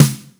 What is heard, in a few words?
Snare Soft TD7